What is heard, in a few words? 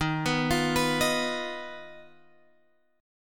Eb Suspended 2nd Sharp 5th, Guitar, Drop D